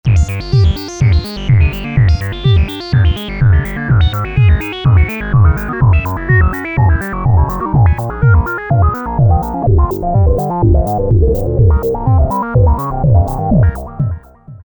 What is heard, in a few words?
Filter Muzik, Korg MS2000; Factory Patches